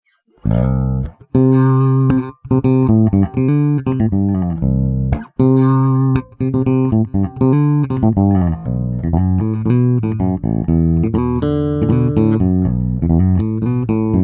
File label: Spirit XT2 Finger both; Finger Style (beide PUs)